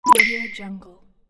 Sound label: push button click